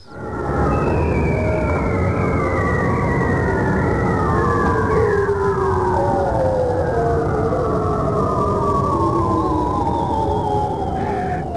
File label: noise